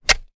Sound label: industrial shovel single dig into soil; zapsplat